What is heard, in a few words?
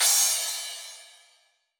Hi-Hat Heavy, Electronic Drum Samples; E-Punk Project Drum Samples